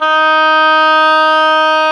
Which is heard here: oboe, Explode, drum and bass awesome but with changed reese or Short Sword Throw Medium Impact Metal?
oboe